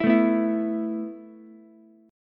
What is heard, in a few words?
E Suspended 4th E, down, Ukulele; Esus4 strummed